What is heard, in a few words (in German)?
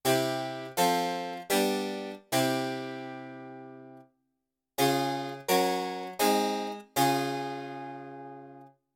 Kadenzen Mitteltoenig C - Des